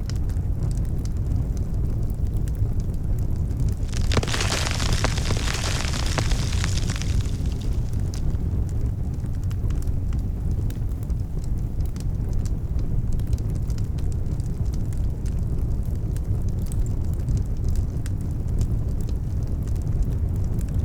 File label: outdoor fire; anemoi